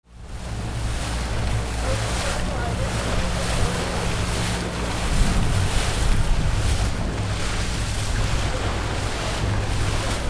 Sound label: BOATS